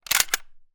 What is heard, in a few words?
weapon gun sks; still north media